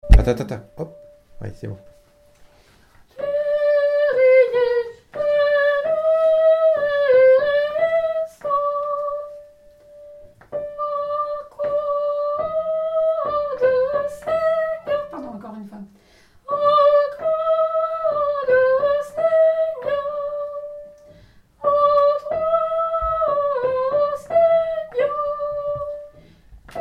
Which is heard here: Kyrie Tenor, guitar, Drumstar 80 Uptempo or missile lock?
Kyrie Tenor